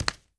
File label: flesh, player steps; quake2